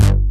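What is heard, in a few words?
double bass; Pro-Rec - Synthsonic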